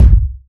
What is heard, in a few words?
perfect kick sample F sharp key; kick drum samples